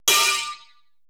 Procussion

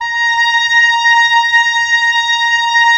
WND OB F, Oboe f; Roland - LA Composer vol. 4

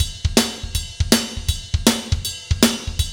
Fast Shuff, Drumscapes Roland; Northstar

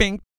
PERC, Vocal, Jazz FX, BASS PERC

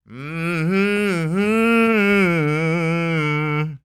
SING, Blues Soul

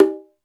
Conga, Alesis D4 drum machine

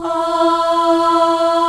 MIXED, ORCHESTRA; Roland LA

